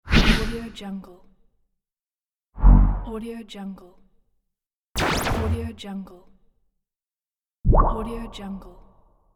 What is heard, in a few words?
whooshes